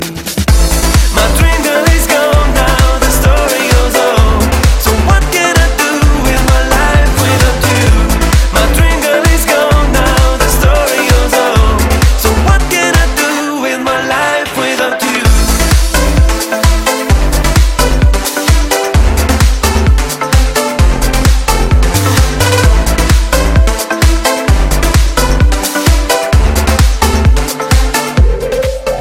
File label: Remix